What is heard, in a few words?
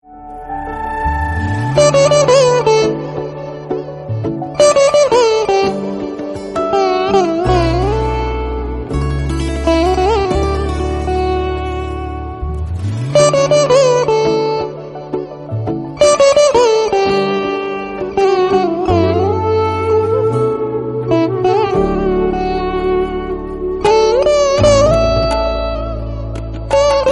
veena